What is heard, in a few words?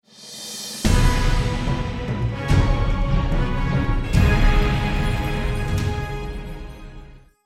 Win Level